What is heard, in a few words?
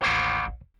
Rickenbacker Bass; Airwindows; Rick-Xtras